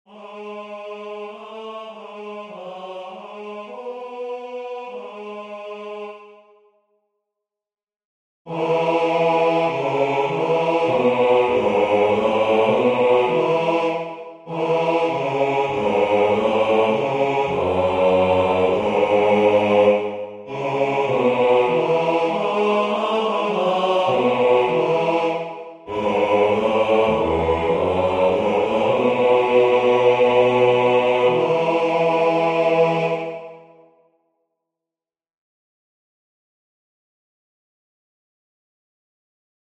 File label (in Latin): Crux ave benedicta Bas; F.Liszt